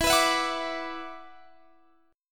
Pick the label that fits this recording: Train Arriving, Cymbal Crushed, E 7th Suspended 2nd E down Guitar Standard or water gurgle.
E 7th Suspended 2nd E down Guitar Standard